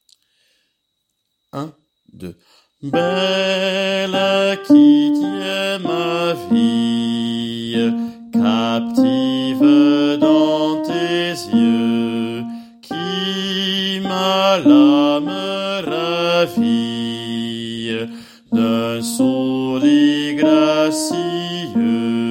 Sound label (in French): belle qui tient ma vie bassi